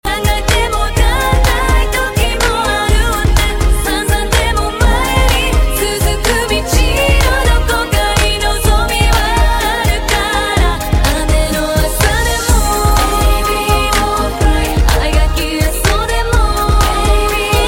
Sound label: Chorus